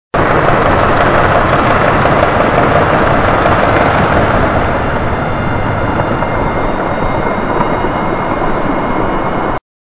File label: ship